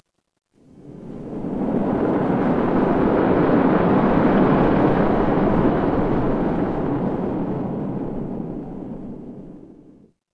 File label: ambience ocean